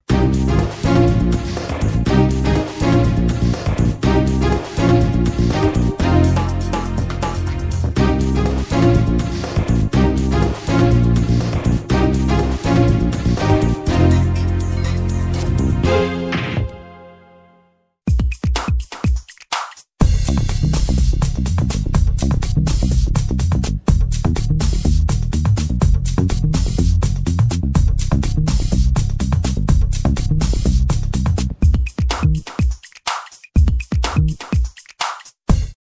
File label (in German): montage